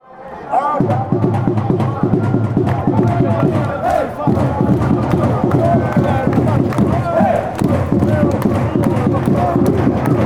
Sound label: hey drums, chants; standard